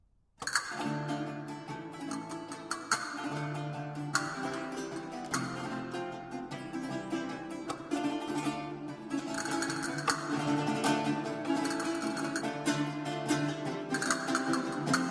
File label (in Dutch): barroque improvisaties